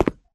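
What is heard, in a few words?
Grunt Movement Footstep Walk Concrete; Titanfall 2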